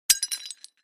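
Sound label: Large Tile Square Pieces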